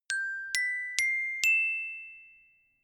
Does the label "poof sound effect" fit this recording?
No